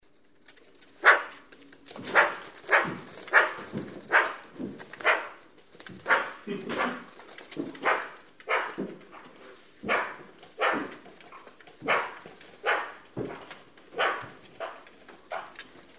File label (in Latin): Pica pica; SETE